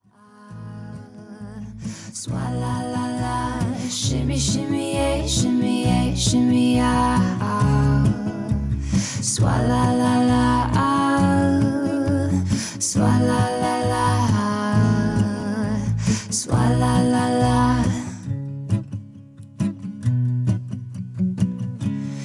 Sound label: Acoustic Version